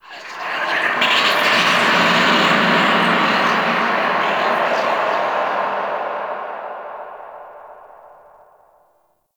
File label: Whispers Medium, Ambience; Warframe; Orokin Moon